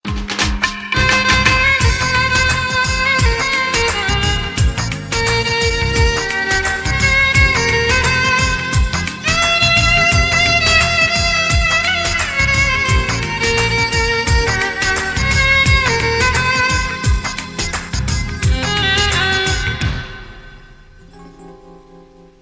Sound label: violin sad ringtone